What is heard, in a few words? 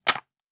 Wood Debris; Fantasy Sounds Bundle; Craft